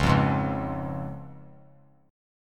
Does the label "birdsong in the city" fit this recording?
No